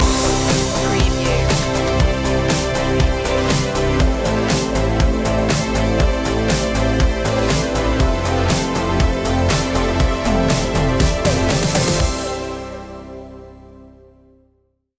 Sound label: ride the synth wave